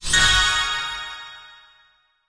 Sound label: ui sports level up